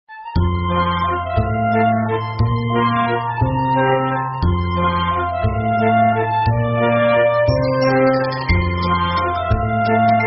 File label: Butterfly Viennese Waltz 59 BPM